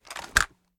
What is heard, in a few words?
raise up; gmod